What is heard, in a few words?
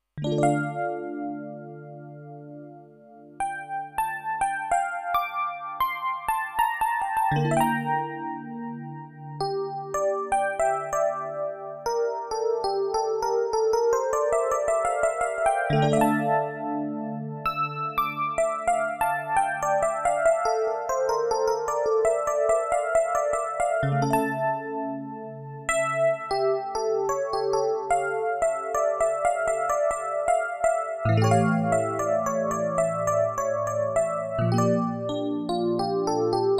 Epiano